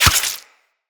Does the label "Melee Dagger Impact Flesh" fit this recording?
Yes